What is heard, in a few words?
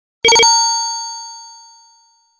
coin; double dragon arcade ost